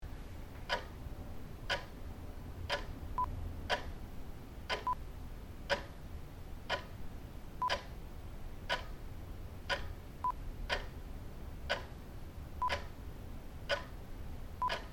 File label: Ticking Clock